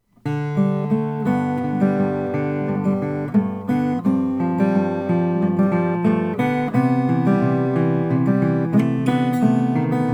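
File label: 2014 martin cs 00s 14, guitar; ltd editio; honduras rosew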